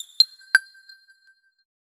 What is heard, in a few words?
a short futuristic ui sou, blip